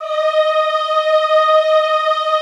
EHH D#4, Classical Choir; Best Service

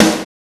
ugly snare; Drums In Your FACE!

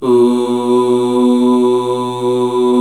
Male Ooz; 90s Sample CDs; Club-50 - Foundations Roland

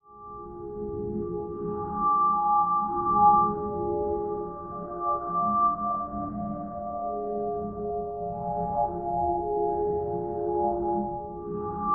EERIE BELLS; Zero G; Malice In Wonderland

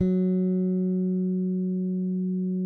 SPEC SUSTAIN, Bass

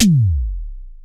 PTX PER; Crypton - DrumBase5000

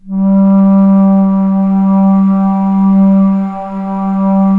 BASS 1 G1 R, bass flute, historical instruments; USB Soundscan vol.23

